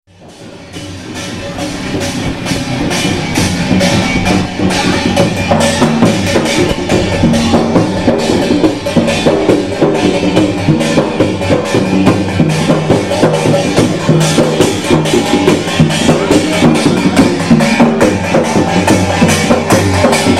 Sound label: procession, India; WFMU